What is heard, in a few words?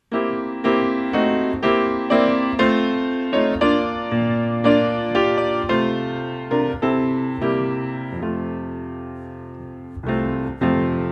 Sing to the Lord With Cheerful Voice, piano